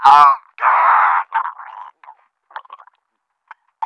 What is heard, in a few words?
death; action-quake2